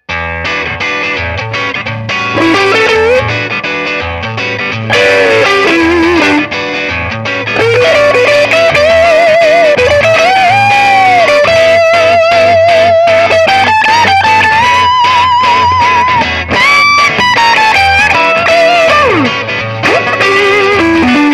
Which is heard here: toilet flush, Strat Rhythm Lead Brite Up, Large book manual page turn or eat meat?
Strat Rhythm Lead Brite Up